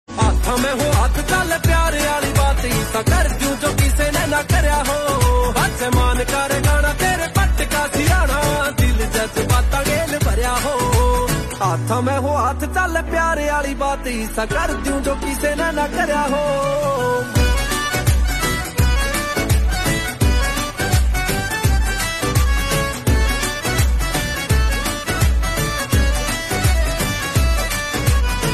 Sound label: Ringtone